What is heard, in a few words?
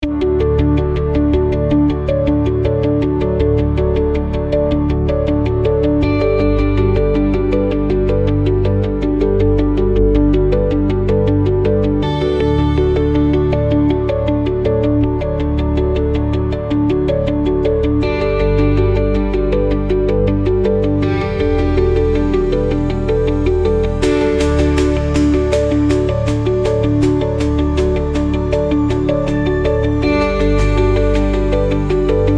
Ambient Synthcaster; ai social media music